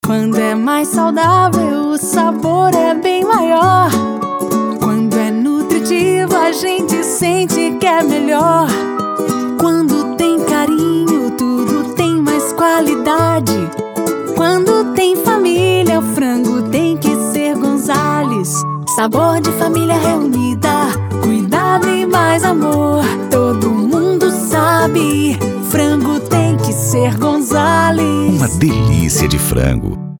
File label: jingle